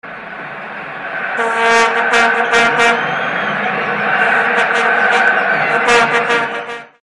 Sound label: Vuvuzela